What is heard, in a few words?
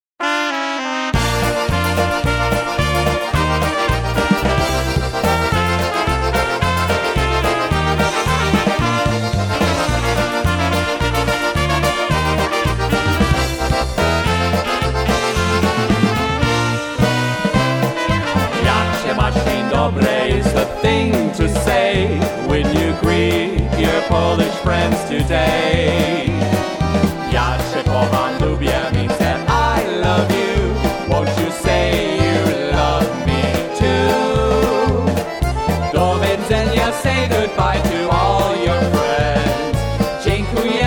Polish Greeting Polka